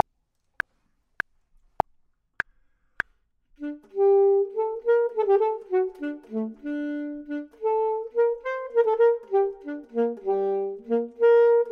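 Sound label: top, Saxophone and Clarinet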